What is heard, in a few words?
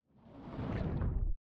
Forest SFX, Eater Sink; One Hand Clapping; PS4 PS5 Stadia Switch Windows Xbox One Xbox Series X|S